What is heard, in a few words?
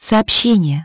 menu; Hyundai H-MP718